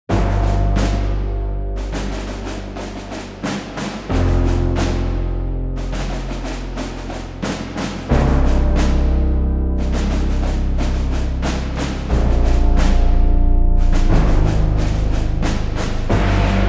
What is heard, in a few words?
Dramatic